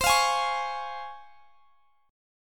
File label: Db Major C; Db/C; strummed